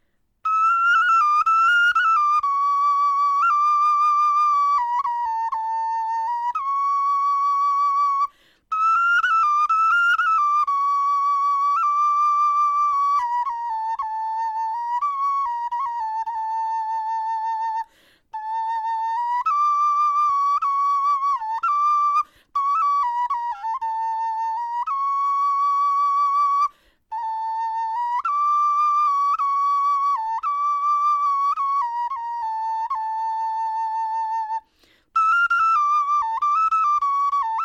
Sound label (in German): 15G melodie, knochenfloete